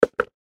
Paper Cup Set Down On Table; Set Downs; Foley

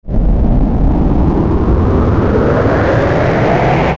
Ascend Dark Fuzz Buzz; Blastwave

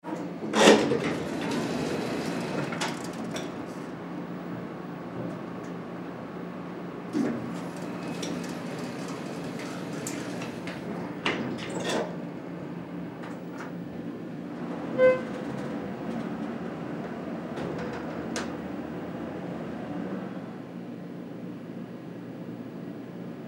Elevator Doors, Elevator Doors Close; Elevator Doors Close Move Up One Floor Doors Open; Industry; Elevators

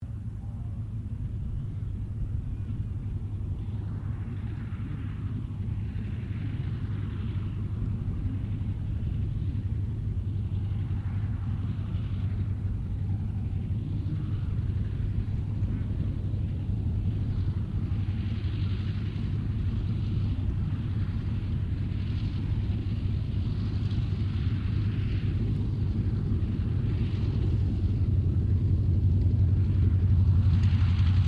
AIRCRAFT CA-28 CERES TAXI DISTANT; Airplane Sound Effects; Airplanes (Sound Effects:Vehicles)

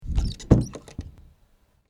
Close Door Squeaky Handle; Creaky Door Sound Effects

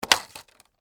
Skateboard Flip, Mini, Up, Cement, Surface; Sports; Skateboarding; BlastWave

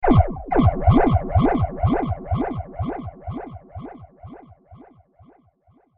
Twisted Theremin; Miscellaneous Cartoon Sound Effects; unusual

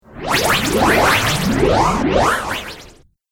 Magic Rpg Dimension Spell; Magic Sound Effects

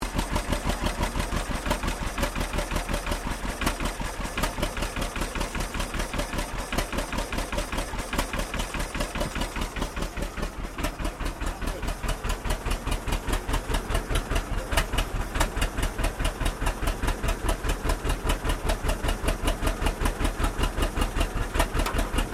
Truck 1923 M2 40 Idle; Thornycroft; Classic comercial vehicles; Trucks

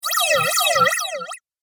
Game Fast Laser Waves; Video Game Sound Effects

